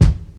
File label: good kick drum sample e key